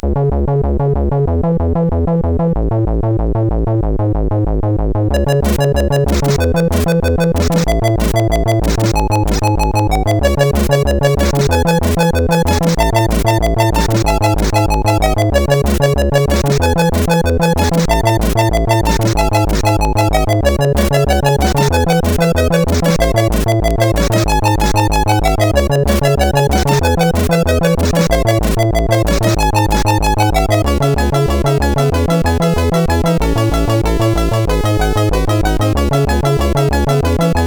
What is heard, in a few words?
Disco Rock, CSG8580; CSG8580R5; hvsc